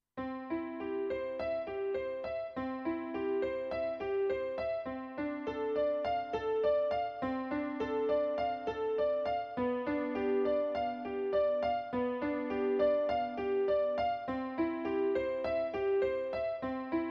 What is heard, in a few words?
Honky Tonk Piano